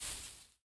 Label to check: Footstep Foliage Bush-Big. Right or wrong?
Right